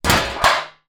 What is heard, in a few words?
foley tin lid drop on floor; zapsplat